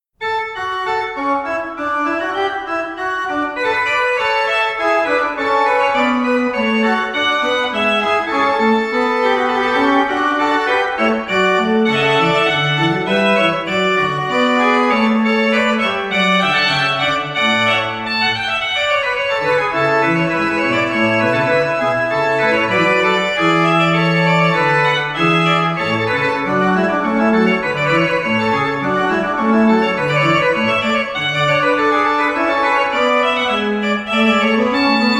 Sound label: Fugue E flat; Den Haag; Nares